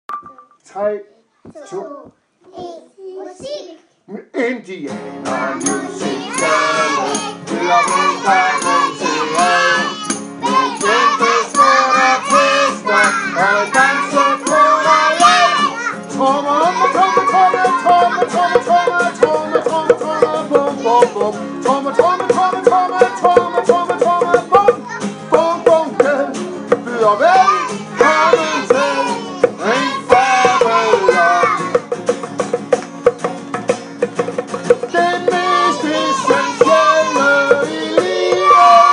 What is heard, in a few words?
Indie Intro